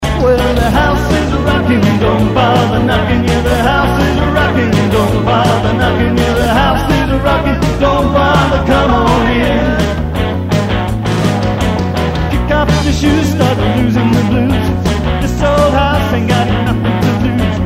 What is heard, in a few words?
house